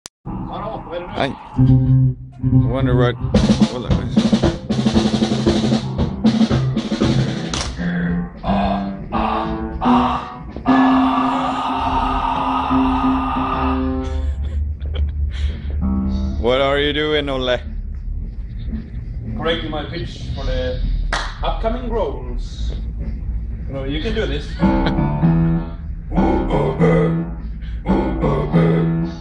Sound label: Death metal vocal exercises